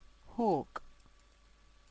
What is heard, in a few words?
hawk